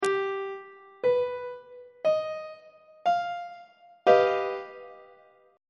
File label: f double sharp augmented 7th chord; on treble clef